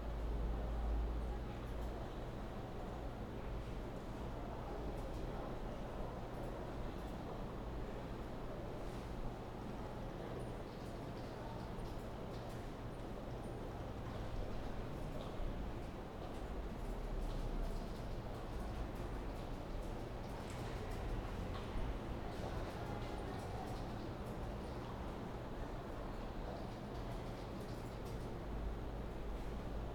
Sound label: indoor townhall; anemoi